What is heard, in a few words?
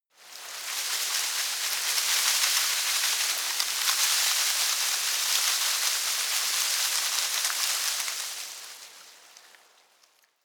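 shrub little leaves rustle medium, garden; haus-garten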